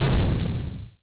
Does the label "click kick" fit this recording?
No